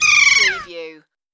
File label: Kea Call